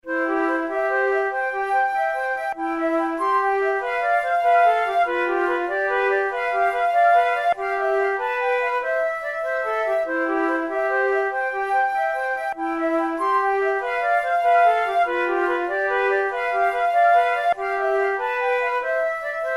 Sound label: duets, flute; kohler